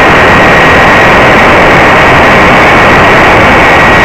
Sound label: engine